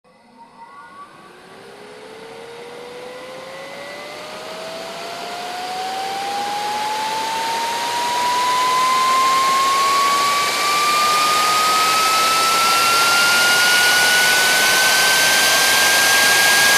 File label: Electric Turbine, power on off